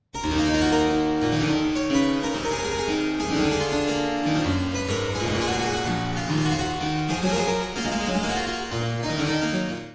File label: courante